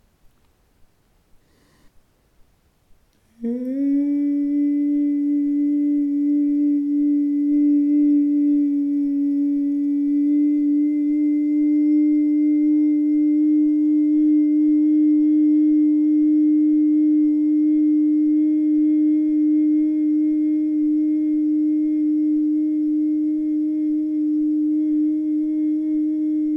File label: Chakra tune Ajna